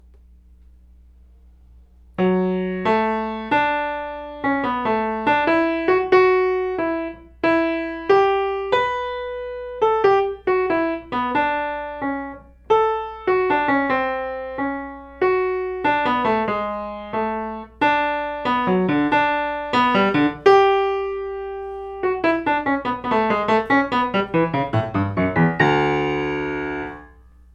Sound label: Cello; Mendelssohn; ear training level 3